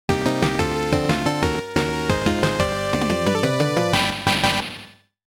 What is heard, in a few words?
Stage Clear BGM; super star soldier